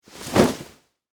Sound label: Suit Coat Shake, Foley, Clothes, Suit, Coat, Shake, Single; Dressing; BlastWave